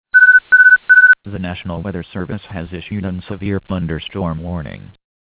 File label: severe thunderstorm warning